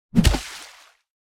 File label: arrow whoosh hit water splash; zapsplat; warfare; player; micromayhem